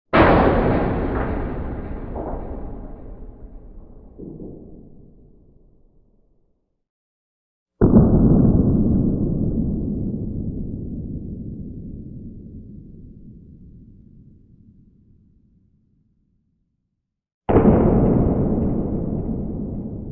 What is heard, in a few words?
Metal Door Slam with Echoes x3; Hollywood Edge Sound Designers Tool Kit